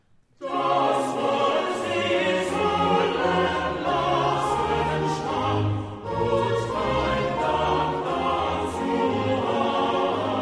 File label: Chorale; Das Wort sie sollen lassen stahn; Bach